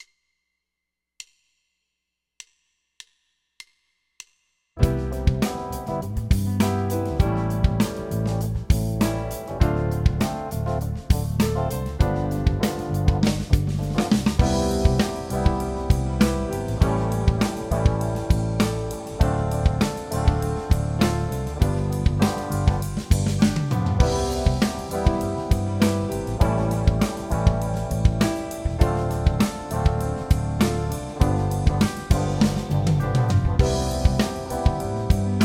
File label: Cmaj7 A7 Dm7 G7; guitar lessons